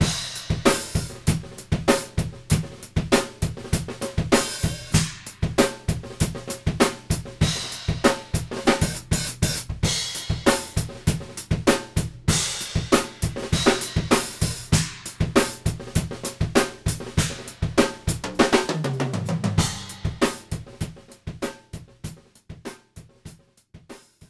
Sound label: dry drums pre eq